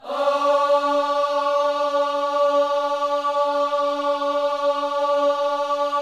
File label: OHS D 4, Gospel; Spectrasonics; Vocal Planet Vol.1 Gospel; akai